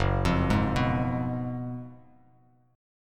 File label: Gb Major 7th Suspended 2nd Suspended 4th Bass Standard; GbM7sus2sus4 arpeggiated; JGuitar